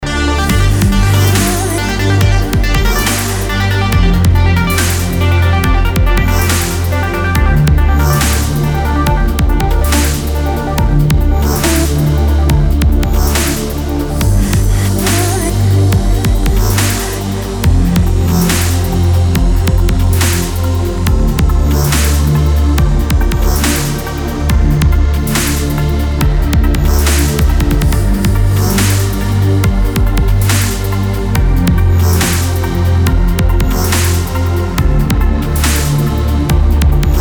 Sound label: Dubstep